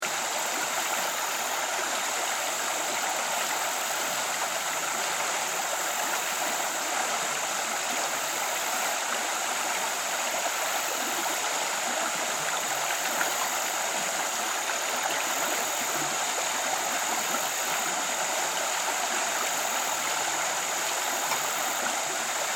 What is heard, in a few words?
Ambience Forest Creek Birds Insects Loop; Forests And Jungle Sound Effects; SFX Bible 6